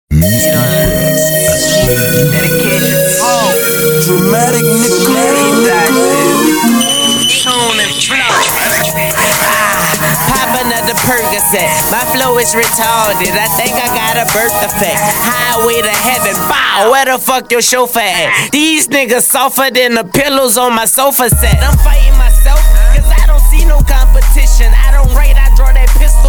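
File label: bass for car audio, slowed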